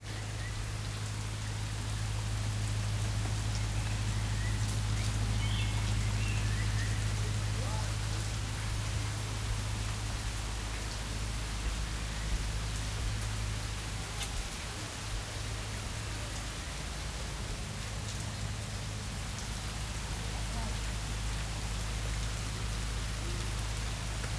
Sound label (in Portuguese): Chuva Mussulo; Ondjaki